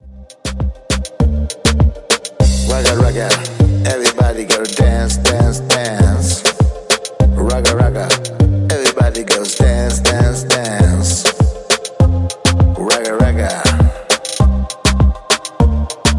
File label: raga